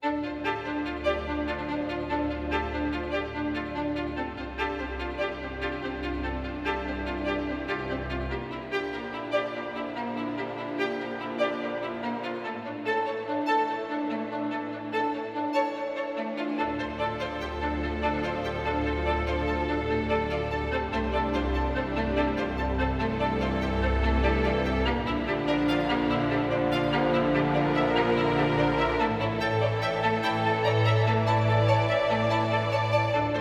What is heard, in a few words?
Autumn Strings; Narrative Mind